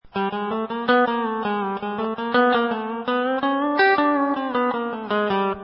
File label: Mid Tuning Chrom